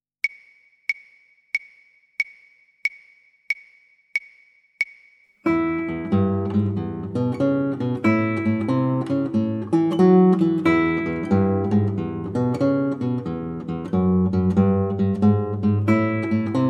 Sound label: Blues Backing Guitar